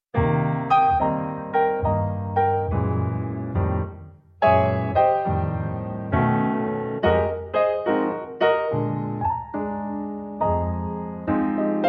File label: Jazz Music